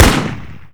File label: machine Gun Single; DoomRTX; gun revised